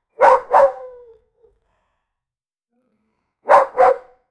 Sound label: sudden bark or growl wild; danger